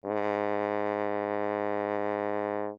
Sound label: Horn ff Ab2, Brass; MIS Pitches - 2014